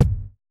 tom, Roland MC-909

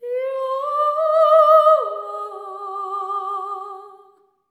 LAMENT

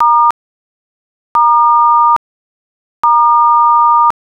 keypad, phone

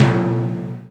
Dance Drums; Best Service ProSamples vol.15; AKAI

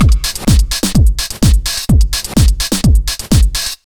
127 BEAT, Fresh Disco House; USB Soundscan vol.20; AKAI

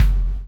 CHAIN; Spectrasonics - Backbeat

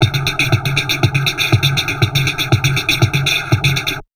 DIST, Drum Loops Crazy Processed

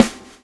swing

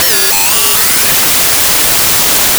RADIO NOISE; Big Beat Samples & More; AKAI

